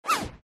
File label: shell whizz